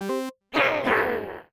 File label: Growls; RPS Start Button